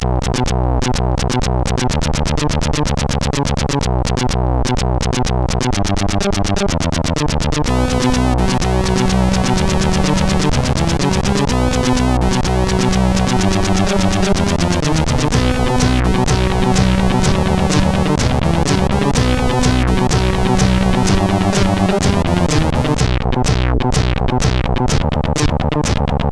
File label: Single Echo, CSG8580; hvsc